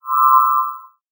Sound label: GUI Swipe